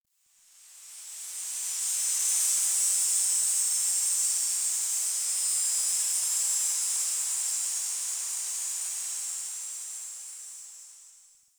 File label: Tree Cicada; Warframe; Ambience; Nineteen Ninety Nine